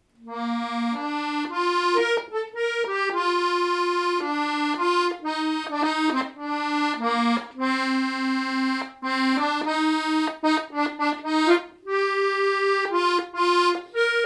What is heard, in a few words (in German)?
Gesang; Wem Gott will rechte Gunst